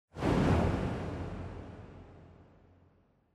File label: Camera Motion Reverb; シーン・カメラモーション